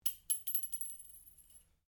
Cartridge 22 Caliber Long Impact Ceramic Tiles Stereo; shell casings of different calibers impacting on different surfaces